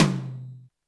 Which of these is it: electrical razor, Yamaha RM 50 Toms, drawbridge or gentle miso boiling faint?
Yamaha RM 50 Toms